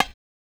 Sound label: Bongo HI, percussion; DRY; MARKYDRUMS